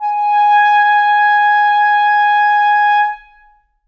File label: reed acoustic, clarinet